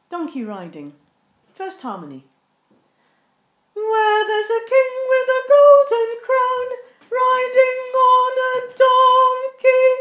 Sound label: Donkey Riding harmony one